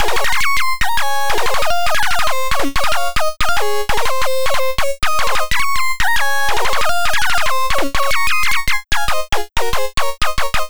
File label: Mesmerizer Lead